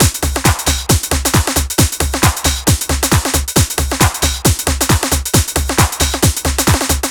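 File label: drum loop 135, right; House; Best Service ProSamples vol.10 - House; AKAI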